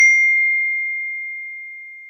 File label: phone notification